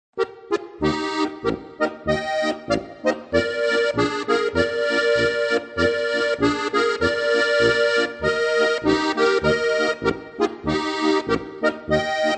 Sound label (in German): polka